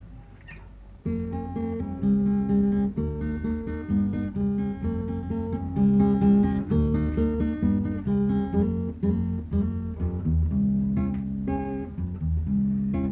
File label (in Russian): klavesin